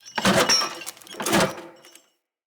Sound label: King Death Foley Accent; Characters; Enemy; Mendicant; MndKing